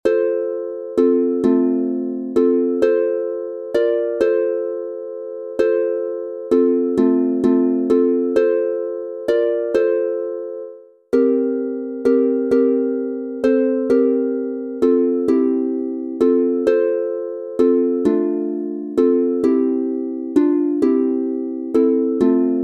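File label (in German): F Dur 3 stimmig instrumental, Harfe; Joseph lieber Joseph mein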